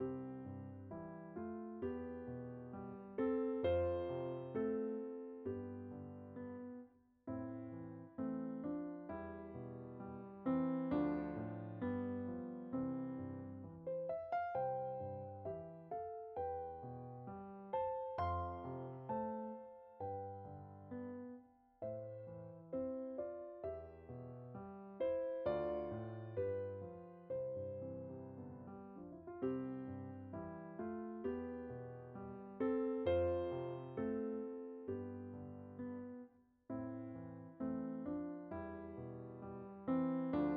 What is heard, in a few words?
Piano